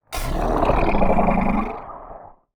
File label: Hit React Sw Lizard